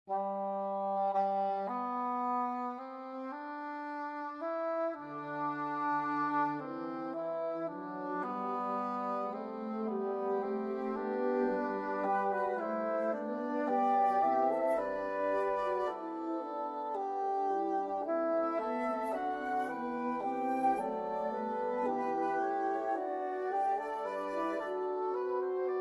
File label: for wind quartet; Johann Sebastian Bach; prelude gott durch deine